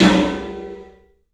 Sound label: PA DEEP L, CLUB SN VRB; 3D Sound; 3D Drums